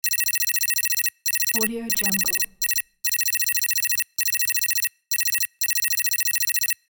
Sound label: computer digital text